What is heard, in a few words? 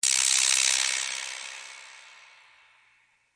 vibra crunchy; Weird and Interesting Hits; single hits